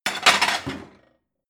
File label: plate multiple shelve hard